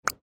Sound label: btn click; 1casino-prizebox-cyber